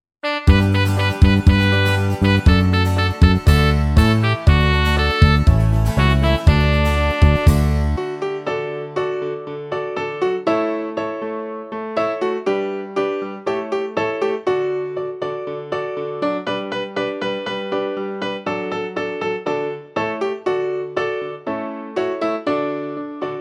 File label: Karaoke